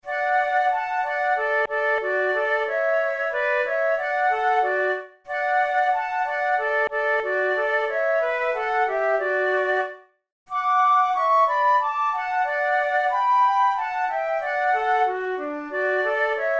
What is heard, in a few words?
duet